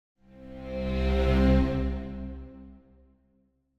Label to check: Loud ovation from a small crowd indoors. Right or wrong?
Wrong